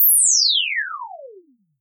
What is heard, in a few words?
atmos whistl; Земной свистящий атмосферик; Свистящие атмосферики возникают при распространении радиосигналов в волноводе, образованном ионосфер